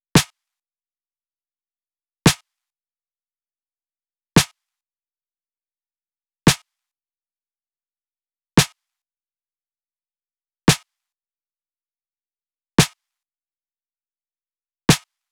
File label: Drum Loop - 114 BPM Snare; Loop Stems; Cymatics; Vibes II Drum Loop Collection; Toast